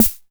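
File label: Vermona DRM2 snare drum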